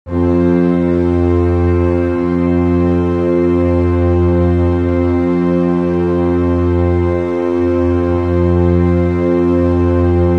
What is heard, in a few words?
f tuning drone